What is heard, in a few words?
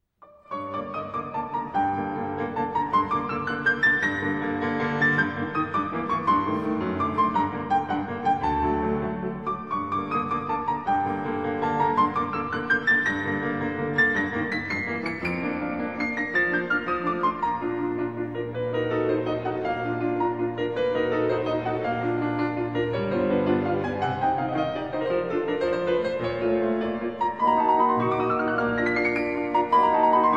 piano